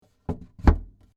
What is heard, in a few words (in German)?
PC Schrank